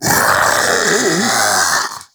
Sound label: Inf Splitter Split Vocal Death, Infested; Warframe; Grey Strain